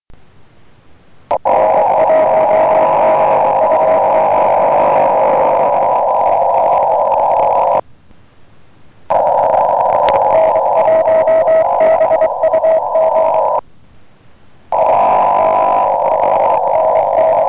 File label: 6m; N1BUG